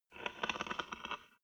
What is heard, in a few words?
wood board scratch